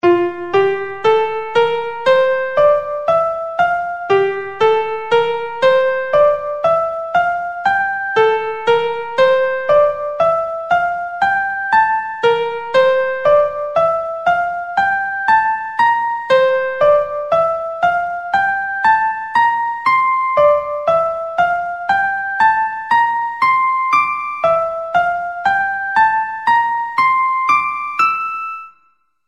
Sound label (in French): mode fa gamme do